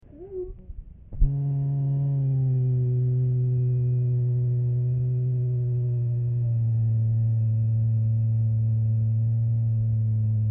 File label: moan, bear sounds